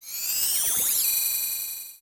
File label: Blasted; Nabooru